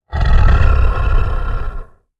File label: Werewolf deep growling